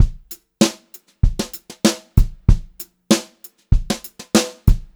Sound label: brushed drum loops, beat; USB Soundscan vol.39; AKAI; 90 s Sample CDs; 96 HOTROD